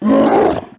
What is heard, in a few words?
brute hurt; trespasser